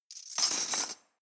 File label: cutlery teaspoons drawer place